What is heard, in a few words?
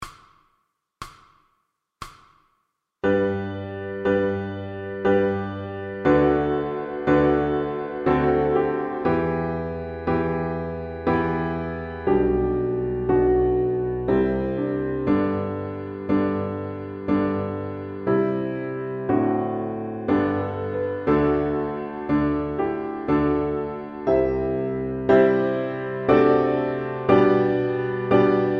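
Largo, Piano Accompaniments for Violin; Grade 1; Xerxes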